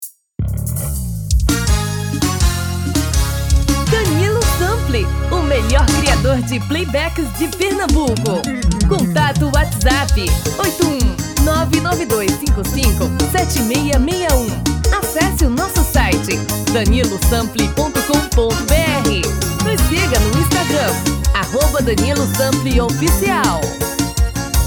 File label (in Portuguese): FORRO um tom e maio abaixo